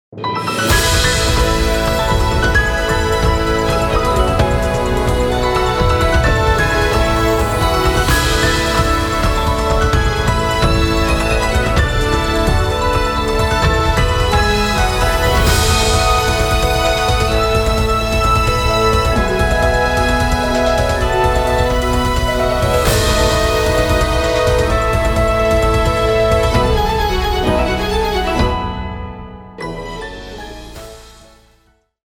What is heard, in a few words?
bgm